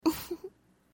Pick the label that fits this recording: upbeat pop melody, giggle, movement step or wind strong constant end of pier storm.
giggle